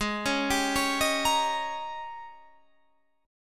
G sharp Major 7th Suspended 2nd Suspended 4th Guitar Half Step Down